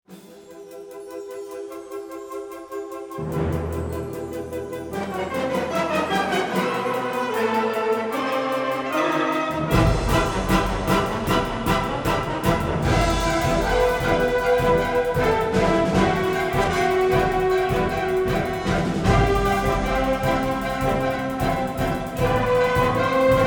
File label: EMT250